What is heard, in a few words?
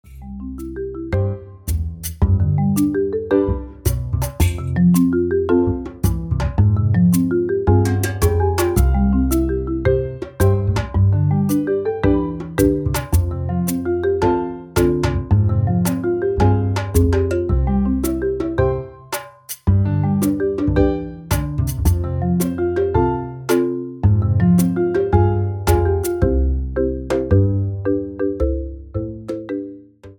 Afro Cuban sample